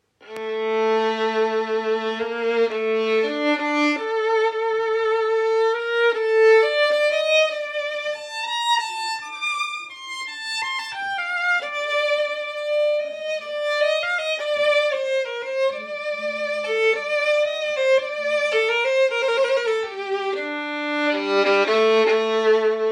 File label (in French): Improvisation violon